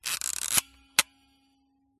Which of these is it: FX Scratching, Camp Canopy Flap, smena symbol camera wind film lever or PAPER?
smena symbol camera wind film lever